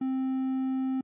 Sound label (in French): Onde Triangle